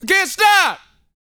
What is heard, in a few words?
VOCAL, R&B Dance, HIP-HOP; Spectrasonics Vocal Planet